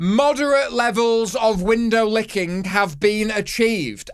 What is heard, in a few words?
window